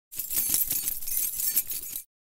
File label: key chain